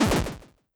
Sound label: death explosion